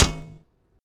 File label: Shield Slam